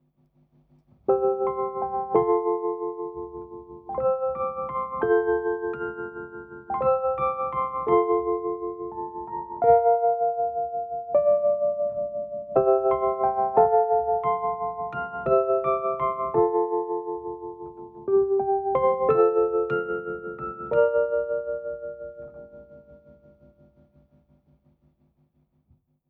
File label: keyb